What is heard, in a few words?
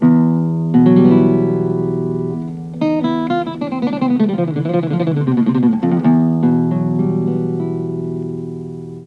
G1, Mellotron; Mike Pinder - Mellotron Archive CD